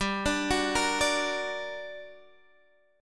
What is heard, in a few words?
G Minor Major 7th G, Guitar Open G; chordshape; down